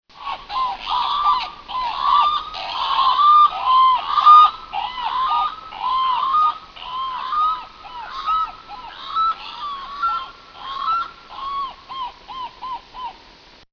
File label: channel billed cookoo